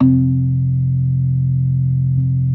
B3 F 2; Best Service; Hyper Dance

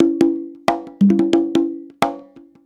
90 PERC; Best Service Advanced Media Trax Modern Composer

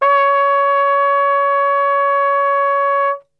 C#3, Brass; Best Service; Brass Super Section

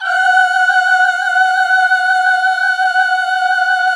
GOSP. AAAHH

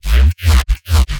Neuro, bass

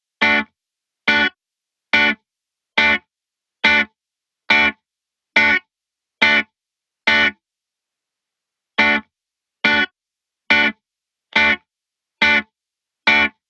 140BPM Dmaj Guitar DRY, Offbeat Dub Guitars; Antidote Advent

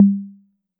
808 CL, Roland MV-8008; Roland MV8800

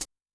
Pedal, HiHat, Yamaha DTXpress-II

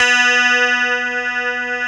STRAT CH B3, GUITAR; AKAI S-Series CD-ROM Sound Library VOL-8

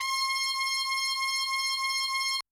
Brass, Yamaha DTXpress-II

